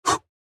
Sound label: Punch